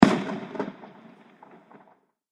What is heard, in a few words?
Fireworks Single Bang; With Sparkle; Celebration, Party, Holidays